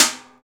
stick, Trash Tuned; Roland L-CD701; Trash+Kitch

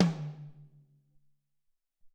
Amb Tom H, Alesis SR-18

